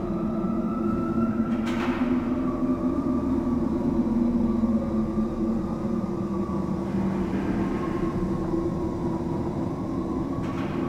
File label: Galleon Room Ambience; Warframe; Grineer Galleon